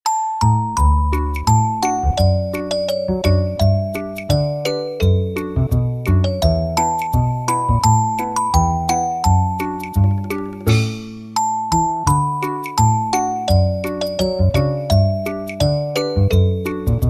new year music

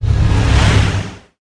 dark

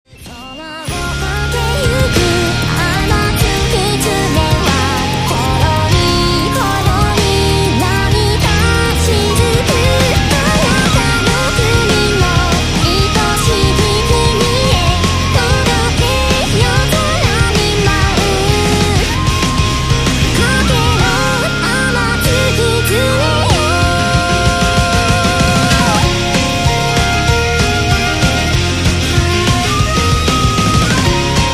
boss battle

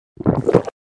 drink

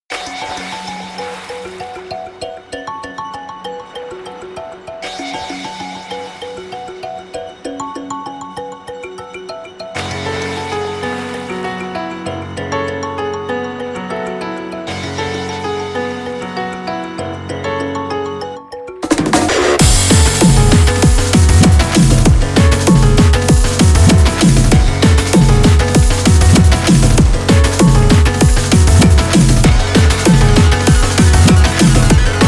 Ocean

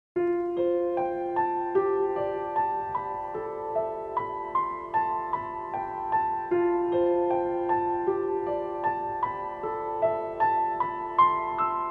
Forest

